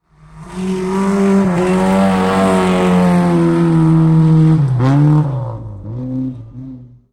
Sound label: rally car pass by; fvtt sonniss gdc audio